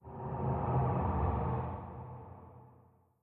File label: amb city planet flyby; Stellaris; megacorp